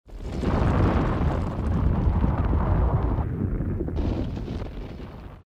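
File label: crack with crumbling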